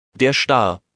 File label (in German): Star, wildvogel